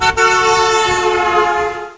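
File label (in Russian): проезжающий мимо автомобиль сигналит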